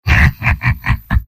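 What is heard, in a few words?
laugh short, male; soldier; tf2; garrysmod; mvm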